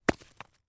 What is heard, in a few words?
impact stone large hit ground